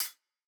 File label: Hiphop hihat